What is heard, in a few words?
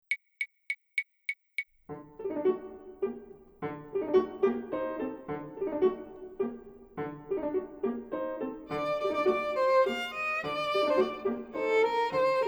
Spanish Dance; 12 European dances